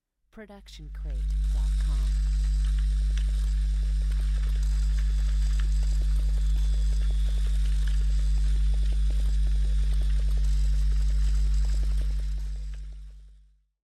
lightsword humming; soundscrate

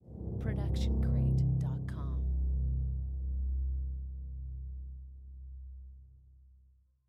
Creepy Low Grumble; SoundsCrate

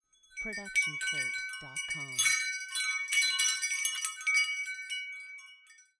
Wind Chimes A; SoundsCrate

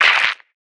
body impact ground

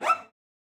Cello Psycho Screech; SoundsCrate

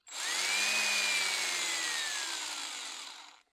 drill rev

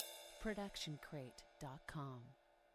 ride cymbal 20 inch; soundscrate

